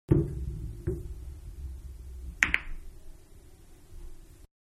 Billiards-Ball-Hitting Two Bumpers And Clicking With Other Ball; Pool; Manhattan Production Music